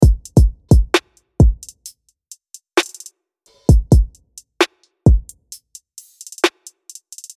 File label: clean trap loop 131bpm